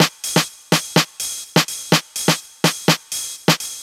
drum loop twosna percussion 125, Classic Chicago House; Sample Magic; Drum Loops